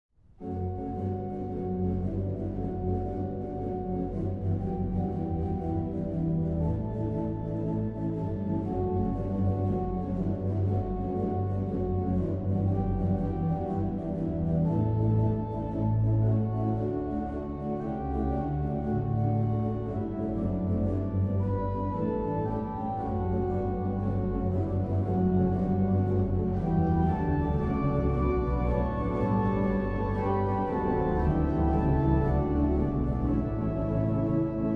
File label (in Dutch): Improvisatie (hoofdorgel)